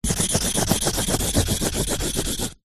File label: office pen marker large scribble scrub out on cardboard